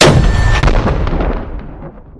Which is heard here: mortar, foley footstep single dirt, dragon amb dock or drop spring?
mortar